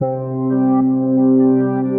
Synth Pad; Meditation Room; Ableton; Creative Extensions; Racks

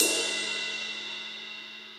Ride Steely Tight, Drum Hits; Drums; Session Drums Studio; MPE Hits

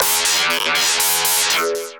Fifth Spring; Effects; Ableton; Glitch and Wash

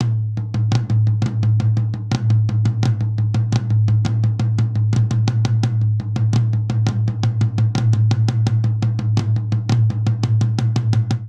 Toms Pop Mid Tom 85 bpm; 4 4; MIDI Clips; Ableton; Factory Packs; Drum Booth

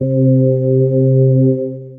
Square Vox, P-50 Linear, Retro Synths; Ableton; Factory Packs; P-50 Structures; P-50 Partials

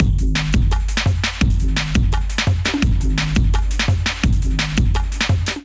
Beat 170 bpm; Ableton; Factory Packs; Skitter and Step; Hit It

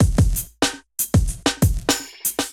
Kit 95bpm, Drums; MIDI Clips; Allee Gate; Ableton; Factory Packs; Chop and Swing

